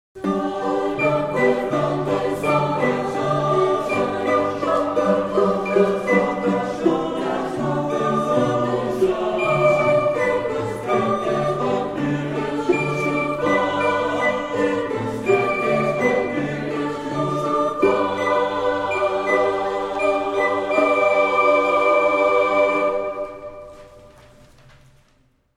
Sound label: Music Collegium; Zaune